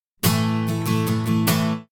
Sample Guitare Acoustique Dry; Eventide Fission

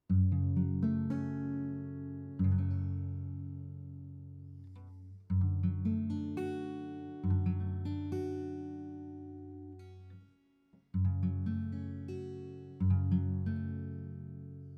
guitare blue microphones spark sl

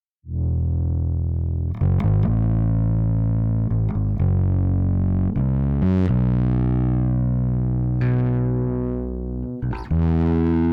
guitare basse; level 100

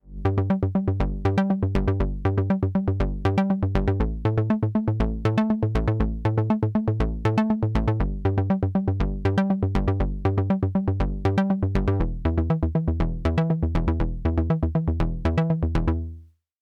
echorec synthé avec effet

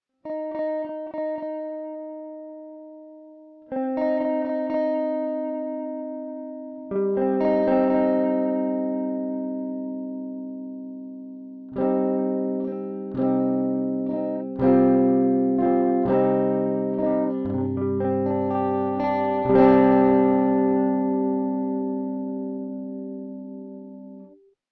guitare echo mode

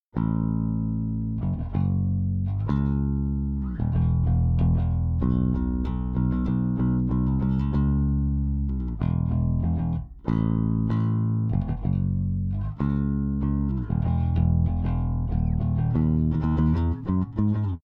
bass sans effet; stoneq 4k

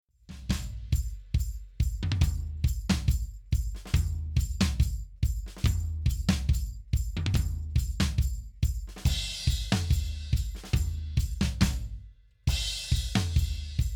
batterie avec; bxconsoleE

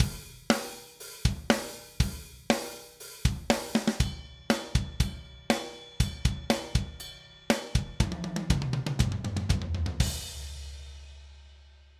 buss batterie avec effet; lindell 354e

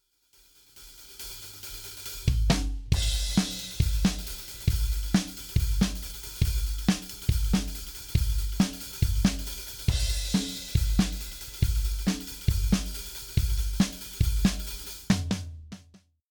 drums sans

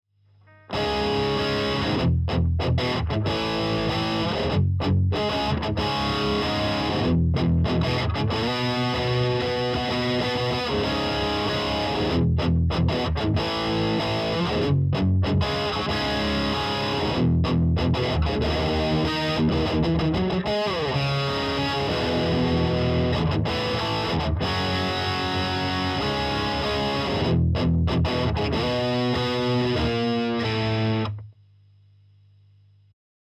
guitare palm mute avec; fabfilter proq3

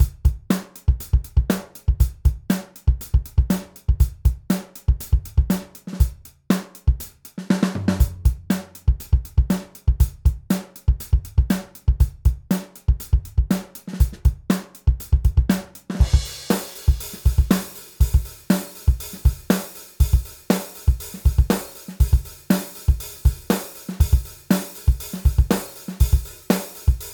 batterie avec effet

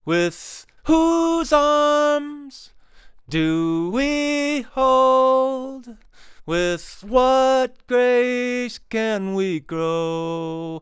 voix high avant

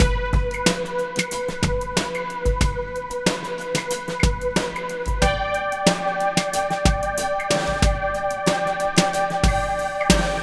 sidechain gate synthe sans

